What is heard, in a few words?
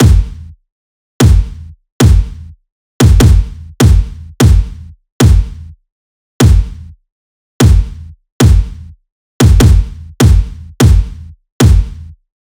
kick 150, Kick Loops; drum sample pack; Galeras; SEISMIC; Slate